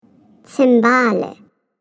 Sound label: CYMBALS